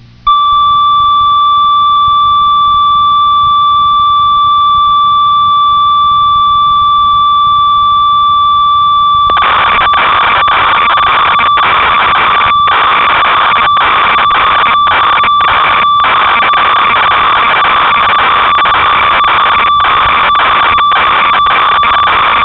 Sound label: ob6, synth